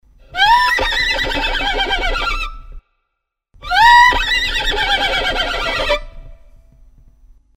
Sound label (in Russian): loshad rjot skripka